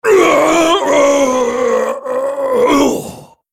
dying; Volibear

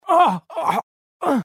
death; tf; cj

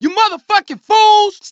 jeers; tf; cj

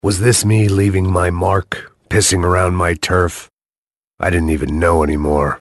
battlecry; max payne

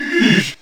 pain; bioclone

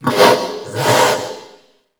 wretch tunnels sight; A Machine for Pigs

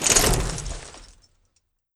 minigun deploy; hl2dm

